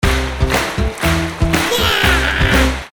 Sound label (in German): JUBEL; Motivation; mainzelmaennchen